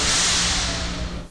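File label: Emergency Brake; OpenBVE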